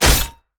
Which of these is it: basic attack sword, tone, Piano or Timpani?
basic attack sword